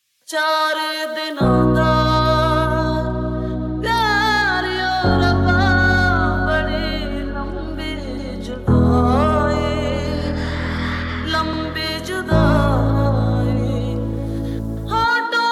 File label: lofi beat